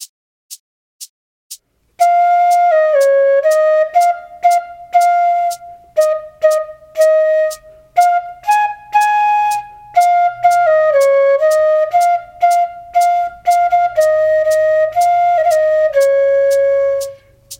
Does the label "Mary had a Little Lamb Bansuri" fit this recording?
Yes